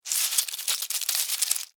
Spider Run Stop, Spider, Run, Stop, Scurry, Movement, Arachnid, Insect, Bug; Spiders; Animals:Insects